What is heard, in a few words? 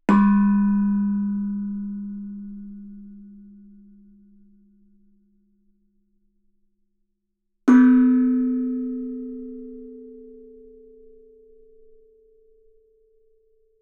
Gamelan; Propeller Island - Complete Gamelan